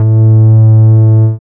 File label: BASS; X-Static Goldmine 1